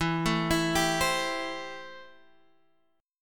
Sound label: A-Minor 7th E